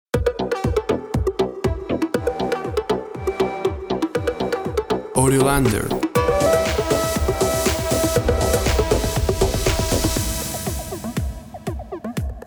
Top 40 Chart EDM

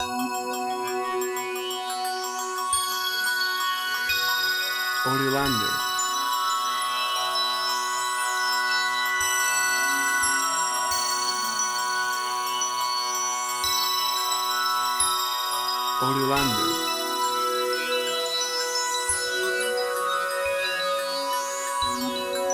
Bells and Crystal Atmosphere

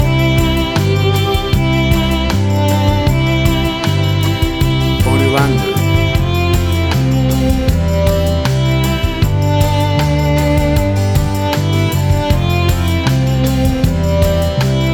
Country Rodeo Cowboy Ballad Music

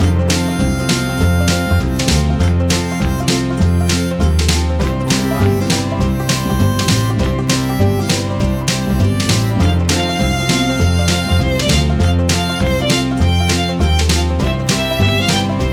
Americana Fiddle Country Music

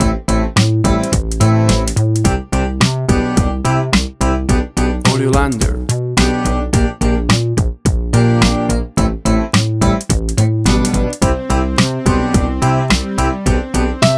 80s Cocktail Twist

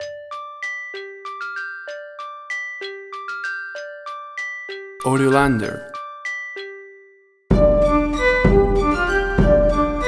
California Calliope 64bpm

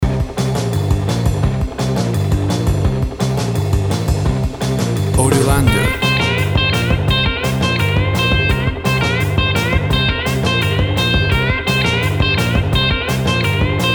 Upbeat 60s Surf Instrumental Rock

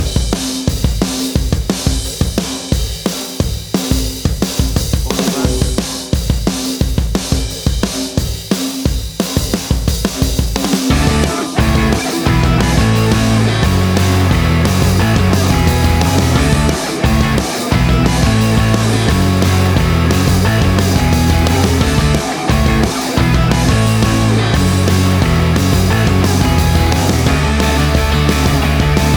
Punk Rock

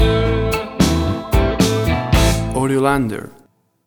Blues Tango Stinger; Blues music with a; AUDIOLANDER

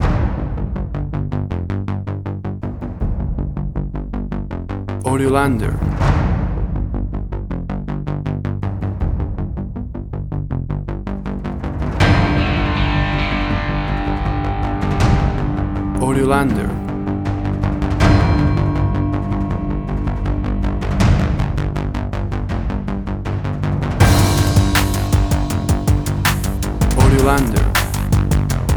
160bpm, Suspen

